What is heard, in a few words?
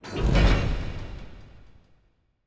smelter puzzle open